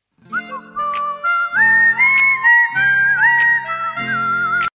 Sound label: whistle sms ringtone; nokia ringtones